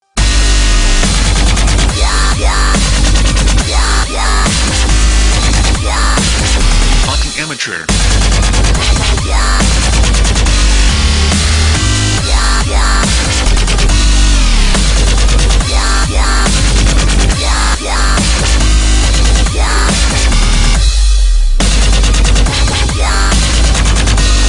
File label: Drop, wub wub wub; TF2Maps